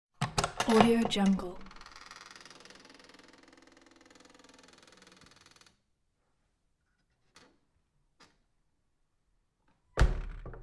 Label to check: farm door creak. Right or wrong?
Right